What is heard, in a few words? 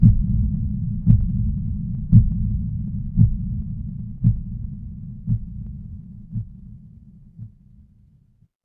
horror heartbeat